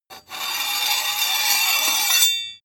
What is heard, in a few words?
sword blade scrape long